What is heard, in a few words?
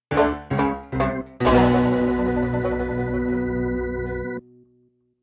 Defeat; Cowboy